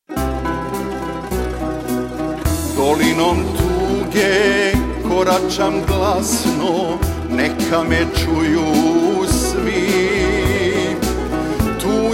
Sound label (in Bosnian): Zvuk tambure